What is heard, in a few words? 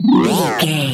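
sci-fi droid robot emote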